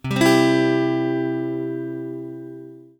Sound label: C chord